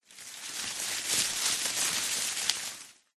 foley tree branch thick healthy leaves movement rustle short